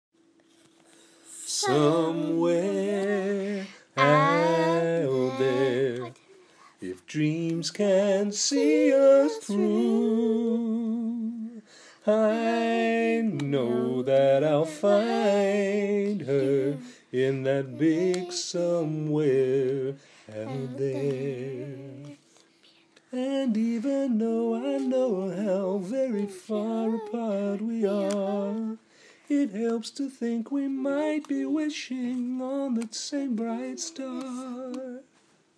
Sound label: duet